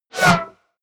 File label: wipe